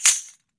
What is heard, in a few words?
JINGLE CLAP, JINGLE BELL